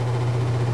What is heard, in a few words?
air small; valve; coil